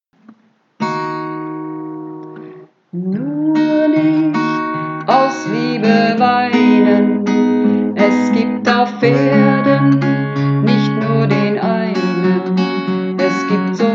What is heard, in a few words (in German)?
Hauptstimme, Uebungsaufnahme